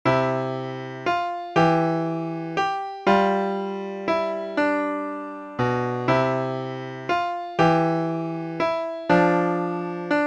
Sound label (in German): Choral, Klavier; Bach